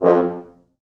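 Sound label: French Horns staccato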